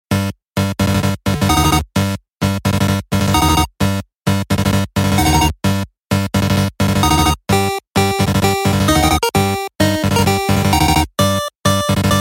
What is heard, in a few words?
8-bit